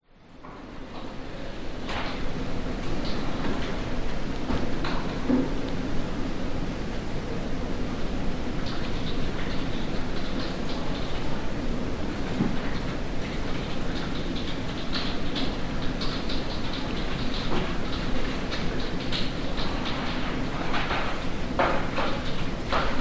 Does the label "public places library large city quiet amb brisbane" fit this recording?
Yes